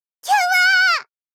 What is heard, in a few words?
section fail; fukari alt